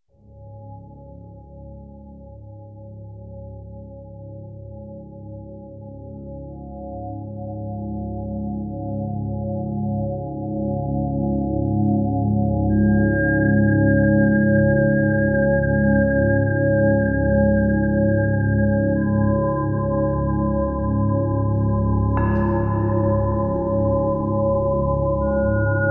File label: Highway Blues